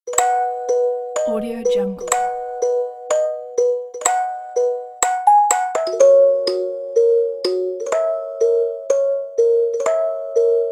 sweet music box

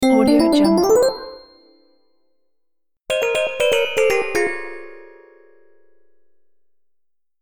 win and lose game

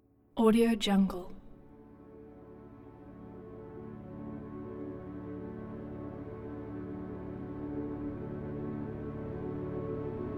airy cinematic riser; hillmusicmedia

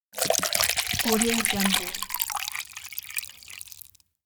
pouring liquid

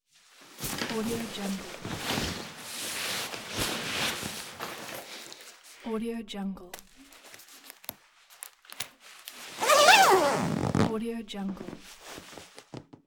jacket zipper

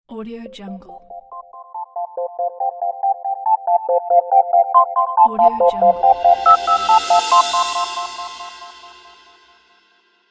a gentle logo

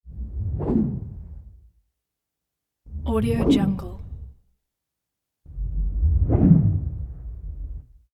slow whoosh airy rumble slicing spinning; prosoundfx

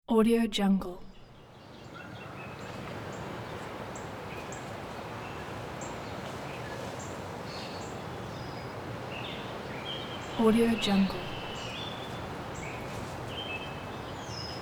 street morning

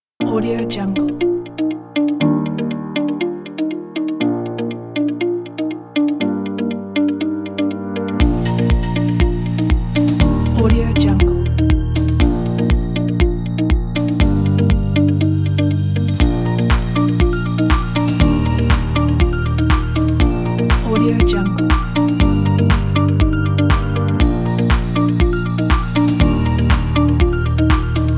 inspirational corporate ambient

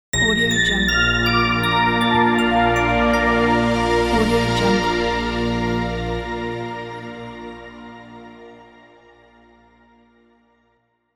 closing logo

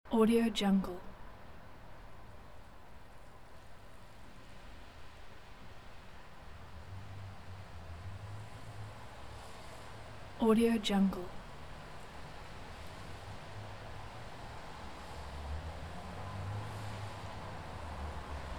small room ambience

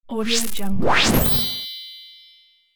whirl sparkle

